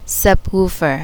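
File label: Subwoofer; hifi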